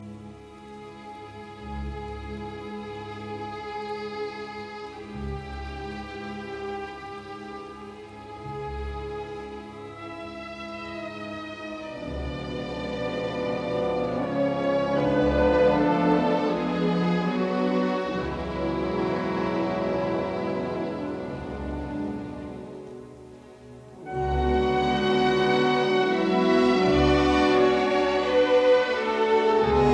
Symphony in C minor, Adagio Feierlich langsam doch nicht; Symphony No 8